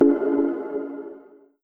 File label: notify